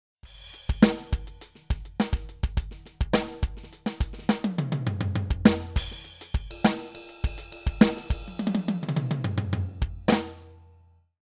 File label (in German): Millenium MPS450 Test Funkband